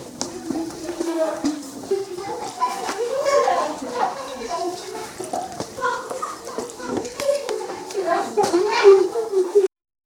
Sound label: elementary school classro